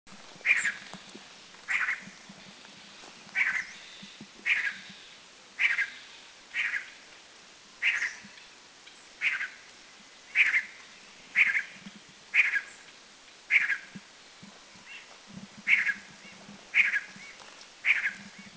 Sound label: Giant Nuthatch, Doi Chiang Dao; Thailand